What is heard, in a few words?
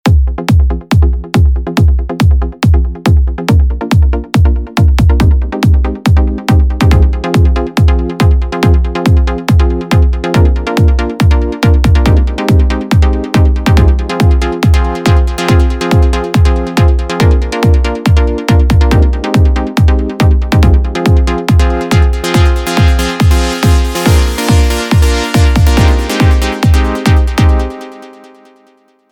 techno; kick factory techno expansion